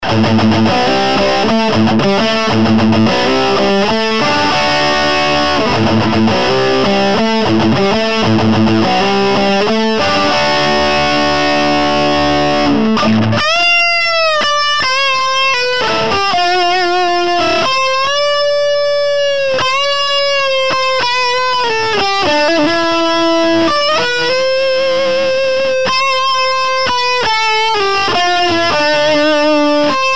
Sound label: sovtek lead hi; sovtek engl lead hi; Модификация Sovtek'а